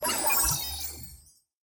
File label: puzzle beaten; Ben 10 - Spot The Difference